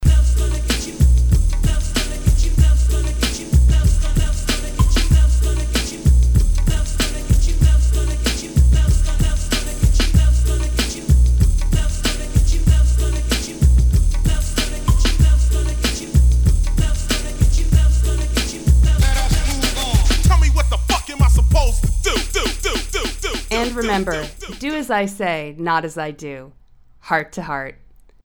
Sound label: jingle; Heart2Heart; Overdose